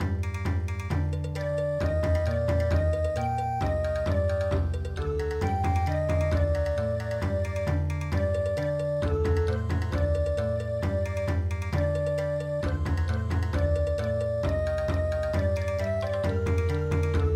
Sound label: Bon Odori